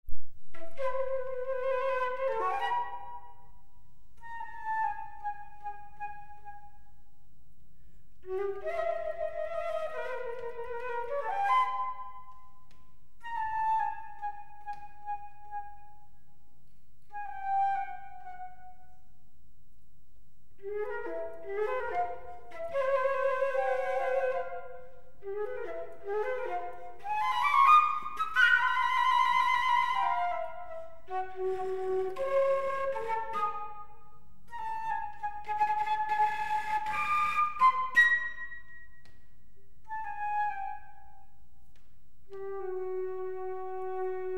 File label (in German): zwei Floeten und Viola; Vier Stuecke fuer zwei Floeten und Viola, Nr. 1 (Anfang)